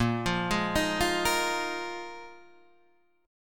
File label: A sharp 7th Suspended 4th A sharp, Guitar, Standard; arpeggiated; 6,8,6,8,6,6; chord; JGuitar